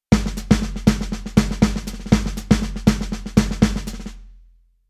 Rhythm Second Line

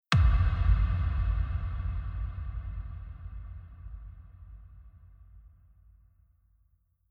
Future Bass FX Kick Reverb

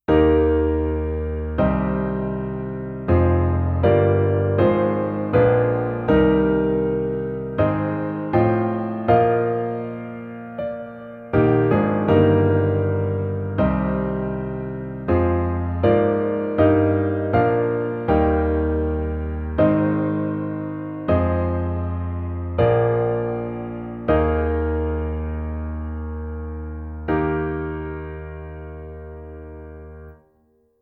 Bass Oct

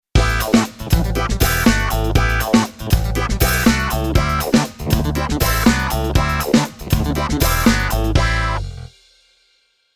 Keyboard Clavi Funk

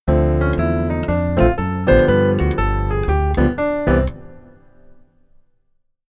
Keyboard Solo Right Hand

